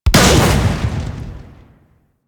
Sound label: Gunblade Shot